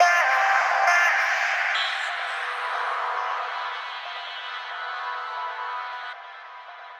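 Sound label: background vocal loop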